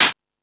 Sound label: Percussion